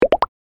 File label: ui pop up